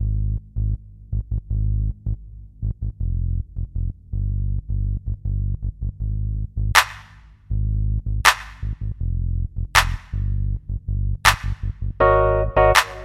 groove

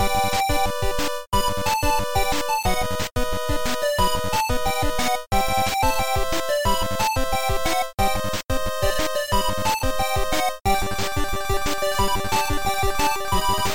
chiptune; steven universe opening

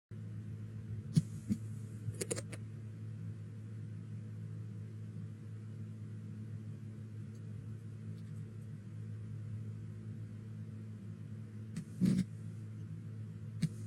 Sick farts

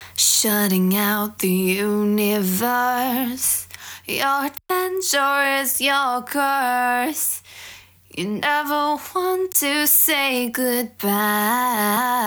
verse, 128BPM; Stems

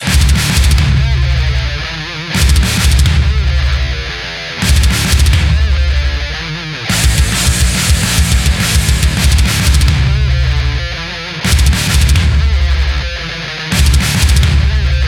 mix example drums can make the difference

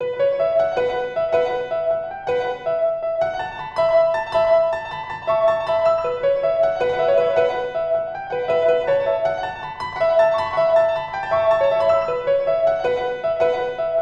Melancholy piano

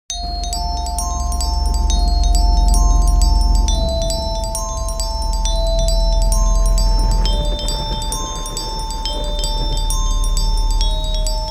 static raindrops and a smoke like storm cloud

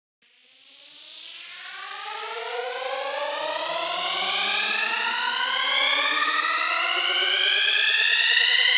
build sweep; 130BPM

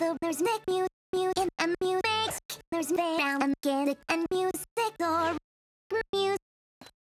dry vox; 130BPM; stems; Fluttershy; Pony Fan Music Remix Stems

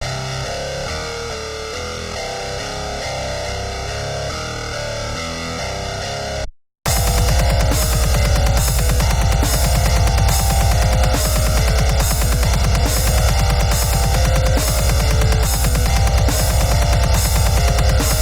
Instrumental

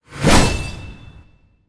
Monster; Zenless Zone Zero

